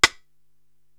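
snare drum Woody X Stick, Drum Machines, Korg TR-Rack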